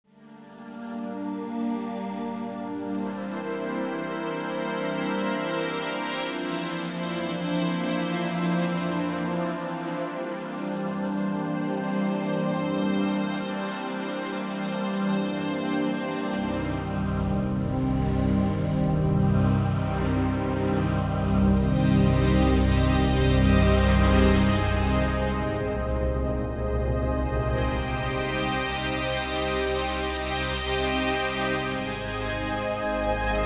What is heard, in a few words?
Ambience; Red Faction Music